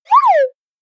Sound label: notification; samsung Aleart